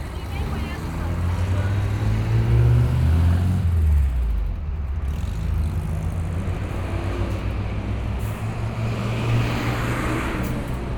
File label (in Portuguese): Na Rua, Fieldrecordings Brasilien